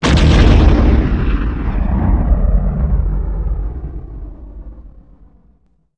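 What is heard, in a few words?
combat explode yt1300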